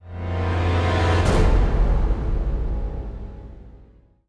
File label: Stinger; Pegasus